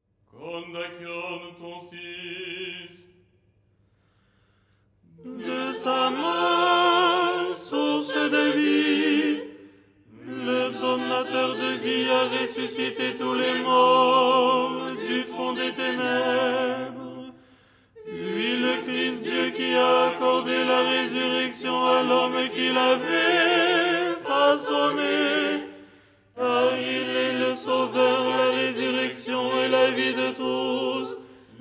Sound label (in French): tenor; Kondakion du dimanche; Les 8 tons en français; ton 6